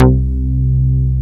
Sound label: MOOG BS; MINIMOOG; Greytsounds VOL-1